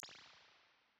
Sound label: impulse all spring